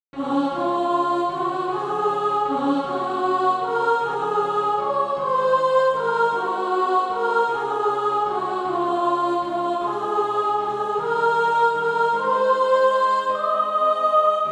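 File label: soprano, Practise Music; Crown Him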